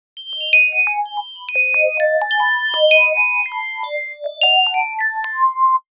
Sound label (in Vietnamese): am thanh anh sao nhap nhay